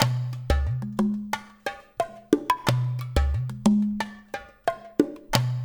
LOOP, Percussion; InVision Interactive; GRUNT

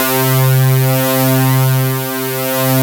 JUP6 BUZZ; Analog Odyssey

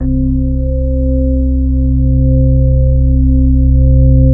ORGAN C; Greytsounds; Scott Peers Sound Engineering

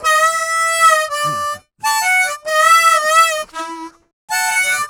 Harm 098bpm A, Harmonica

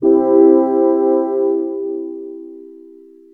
vio analog, dx7

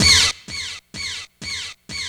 ECHO HIT; E-MU Formula 4000 Series Vol. 2

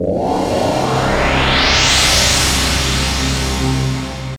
pads C; Zero-G; Total Drum Bass

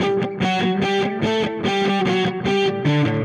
Dirty Chunk Guitar, Indie Rock

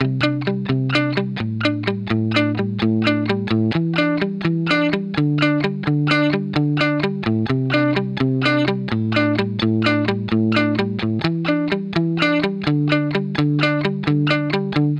rhythm Emin Bpm128, 70s Guitars; Fox Samples; Back to the 70s Guitars

